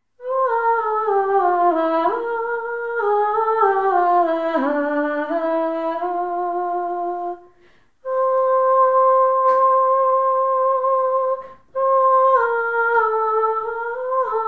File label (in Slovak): slavabohu tenor; spevokol